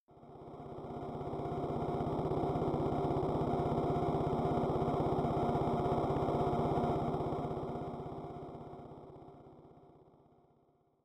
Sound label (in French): moteur noise